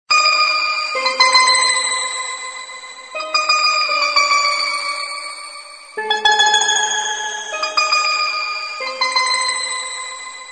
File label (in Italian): Elaborazione elettronica